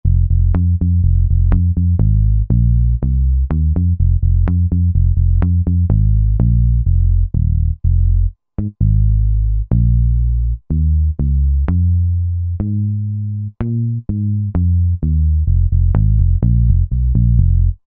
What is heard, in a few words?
Rock Pick; Roland SR-JV80-10 Bass & Drums